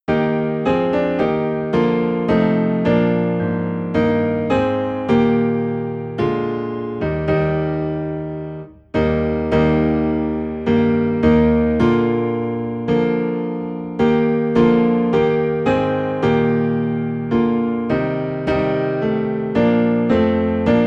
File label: Piano Low in E Flat; christmas; O Come All Ye Faithful